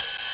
Hats Electro Open

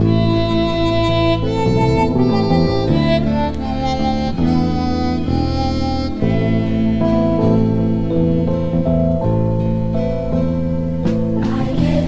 keys, Loops, Sad

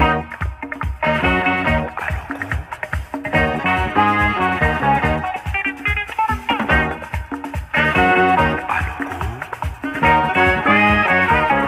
horns, loops; Phonky

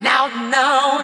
"no no" SUNG; Voice; expletives

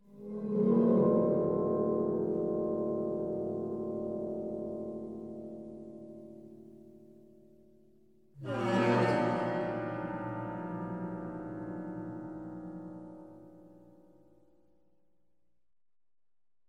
guitar, Sad

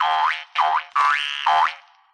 jumps

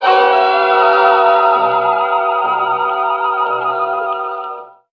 sudden impact, stabs

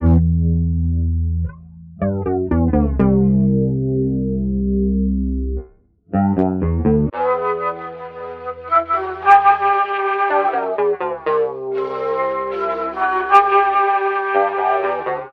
flute, Loops, Phonky

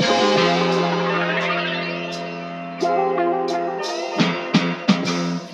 guitar, Jazzmatazz loops

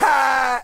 ha, Voice, ooh ahh

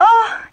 "uh", female orgasm

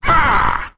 "ha", Voice

